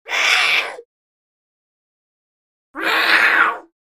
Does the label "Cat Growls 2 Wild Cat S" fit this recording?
Yes